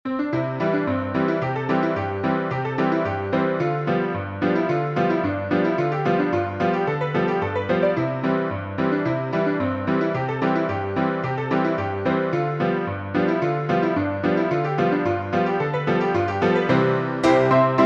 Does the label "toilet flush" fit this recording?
No